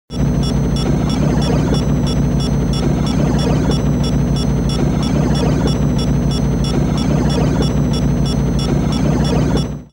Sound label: Computer Missile Incoming; Airwolf